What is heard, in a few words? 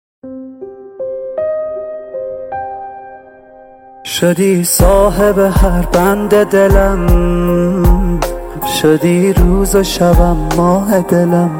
Solo Band